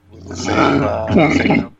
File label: vurp